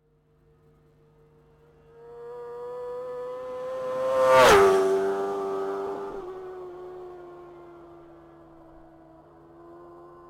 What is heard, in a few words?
Suzuki GSX-R600, bike